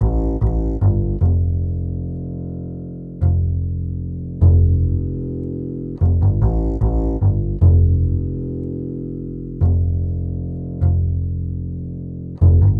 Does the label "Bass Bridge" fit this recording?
Yes